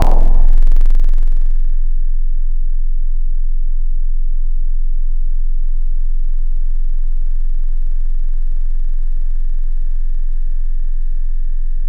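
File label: Tubby, Bells; Synthetic's Serum Collection; Echo Sound Works Sphere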